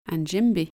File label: djembe